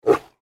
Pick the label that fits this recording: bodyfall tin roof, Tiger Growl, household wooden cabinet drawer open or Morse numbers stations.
Tiger Growl